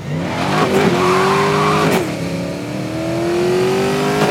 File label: first, vehicles cars; huayra